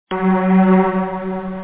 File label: delayed string; OctaMED SoundStudio V1; strings